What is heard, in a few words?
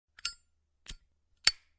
Zippo Click Sound; lighter; zippo linda pickens design